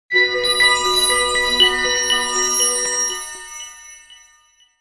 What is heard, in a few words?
spiritual